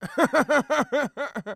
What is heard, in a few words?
Human Male Pirate Laugh Short